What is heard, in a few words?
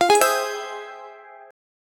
merge 3 mobile game short